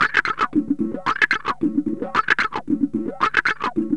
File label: WAH; AMG - Now CD-ROM (Roland); Art of Samplng